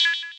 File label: beep; Stream; music; PC World 2005 July & August